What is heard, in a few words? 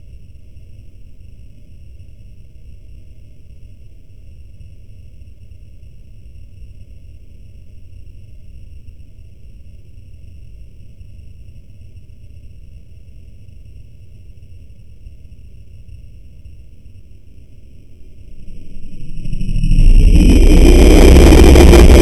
UFO HOVER & TAKEOFF SOUNDS; THE DOME OF THE ROCK